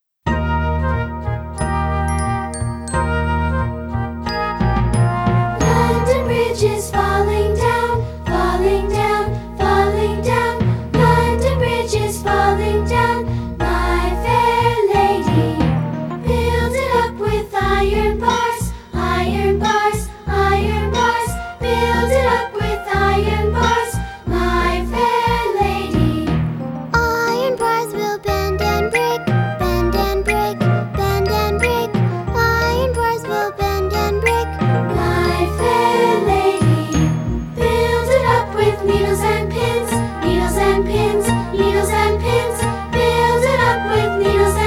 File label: London Bridge